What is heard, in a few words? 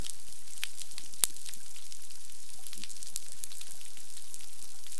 snaps amplified snapping shrimp